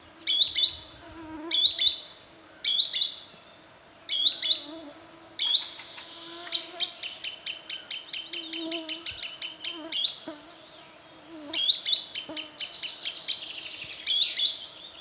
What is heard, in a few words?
Tringa glareola mobbing